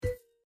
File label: Reel Stop; Holy Goat